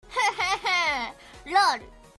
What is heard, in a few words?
hahaha lol